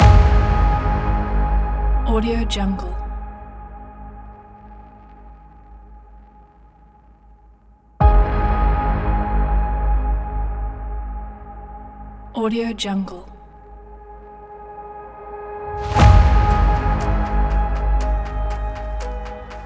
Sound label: danger cinematic suspense